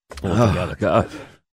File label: Post Puke Reaction